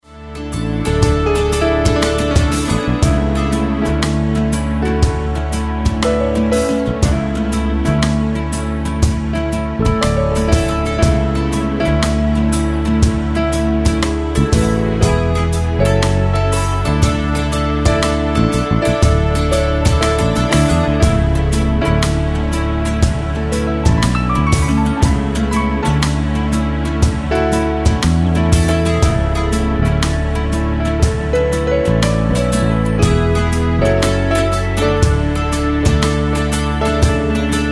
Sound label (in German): Entspannungsmusik